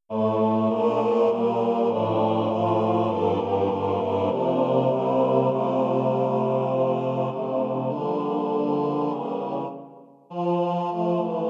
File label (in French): Basses